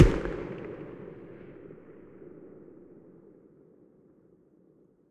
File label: mg muzzle mono very far, weapons stationar